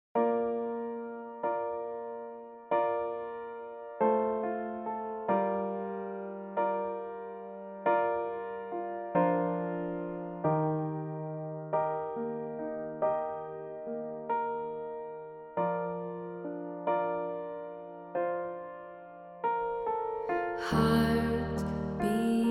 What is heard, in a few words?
piano version